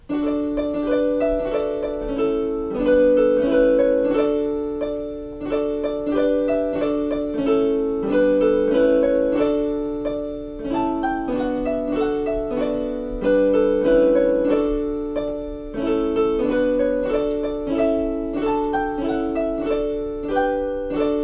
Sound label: keyboard and three recorders alto soprano; good king wenceslas; sopranino